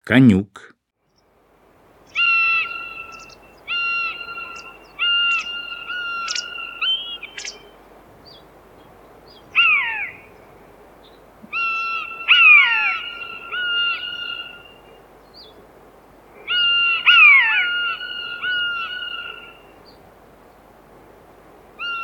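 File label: buzzard; Kaniuk; Golosa ptic i zverei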